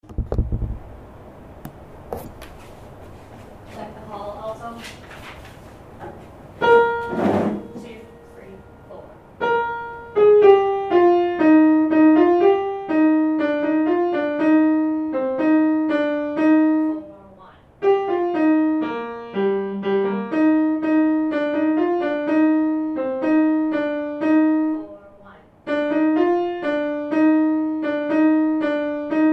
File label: Alto